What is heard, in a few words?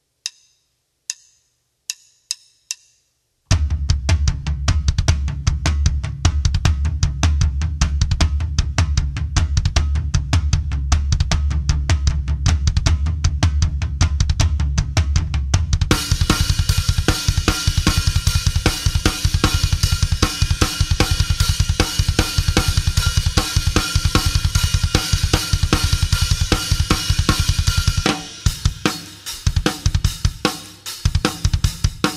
tom & double kick groove